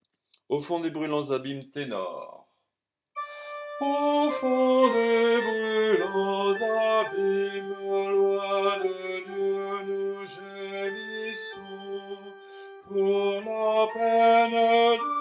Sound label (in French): Au fond abime tenor